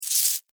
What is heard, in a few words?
Insect Hiss Angry, Insect, Hiss, Angry, Bug; Insect Hissing; Blastwave; Animals:Insects